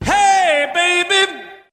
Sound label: hey baby male SUNG